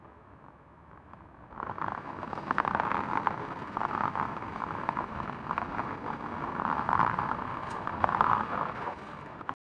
freada de carro no asfalt, road

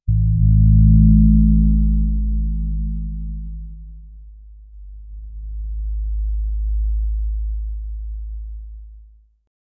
som de tuba grave; brass instrument

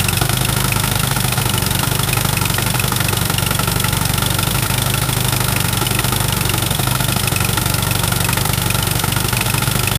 motor 6 cilindro a gasoli; gasoline

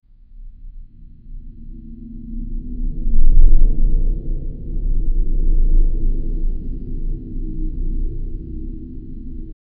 efeito sonoro de dungeon, videogame

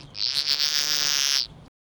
tartaruga brava enfectada; infected

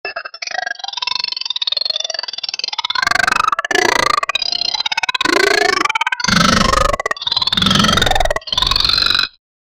faa um som de goblin

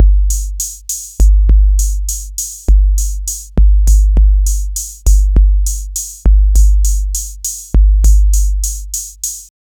sons de bateria da roland, drum machine

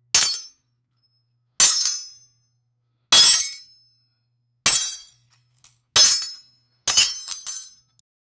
som de duas espadas caind; swords

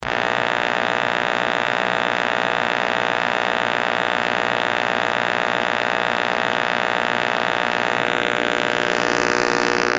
som de erro buzzer errado; error

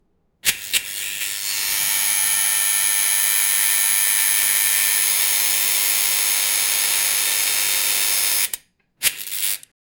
som de uma montanha russa, amusement park; SFX Engine

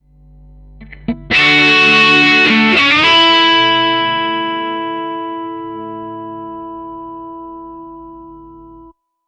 uma guitarra eltrica

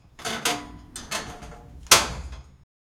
um armario de se esconder, creaking

